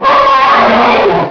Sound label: Bird Monster Death; Playable Tutorial Level; TR2